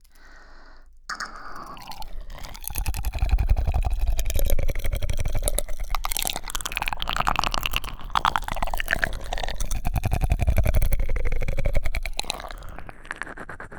分身酸欠舐めコース

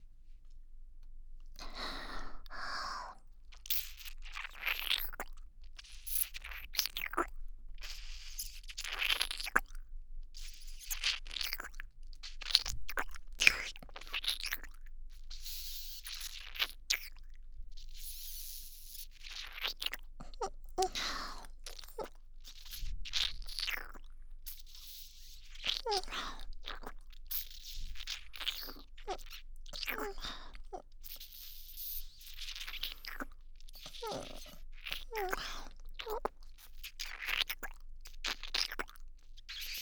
貫通キスコース

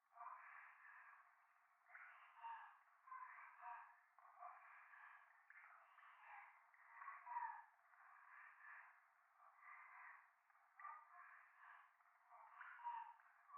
右耳 密着 処女 喘ぎ 雨上がり; 夢の中

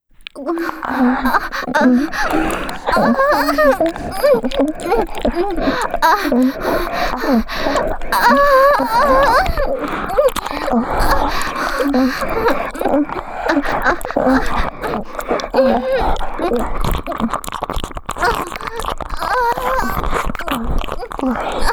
喘ぎループトラック, ASMR; 分身４P喘ぎループトラック

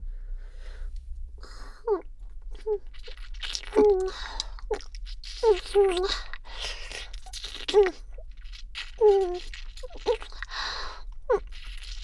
キスコース

